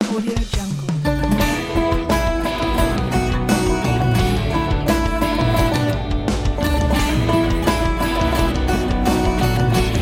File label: kids tv series intro